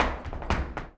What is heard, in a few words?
Flamenco, Tapping, Zapateado Solo; ProSamples vol.46 - Flamenco